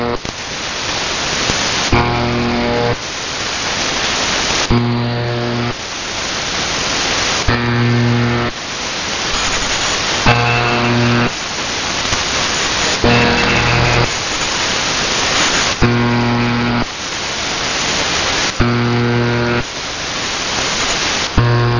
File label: The Buzzer 4625 0khz